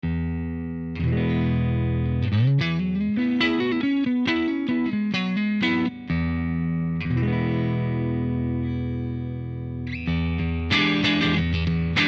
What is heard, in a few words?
Fender PRS